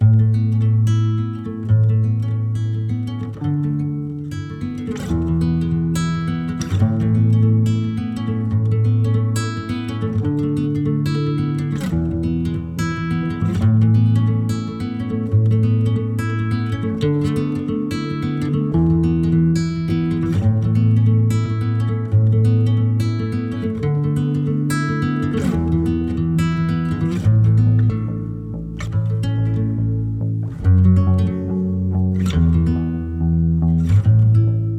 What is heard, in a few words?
Rain In Sight Underscore, Autumn Guitars; Narrative Mind